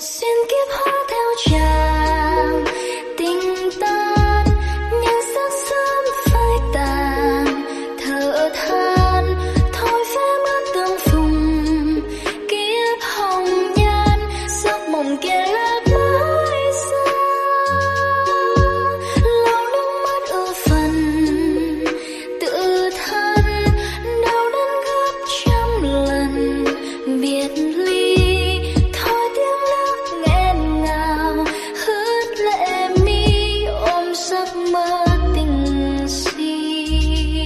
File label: Lofi